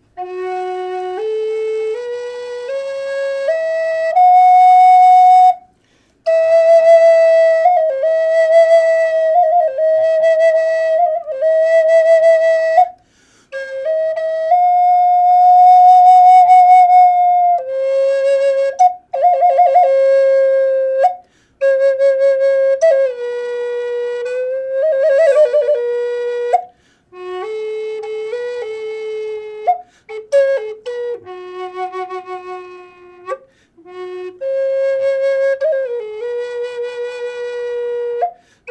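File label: natural, flute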